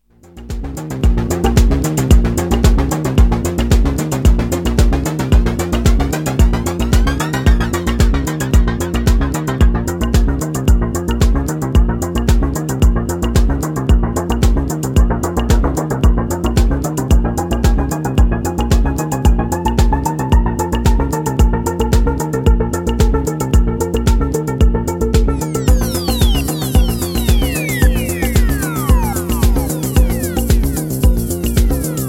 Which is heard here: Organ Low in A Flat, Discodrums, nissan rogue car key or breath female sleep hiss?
Discodrums